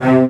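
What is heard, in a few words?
CELLOS D3, Strings; ILIO; Synclavier Strings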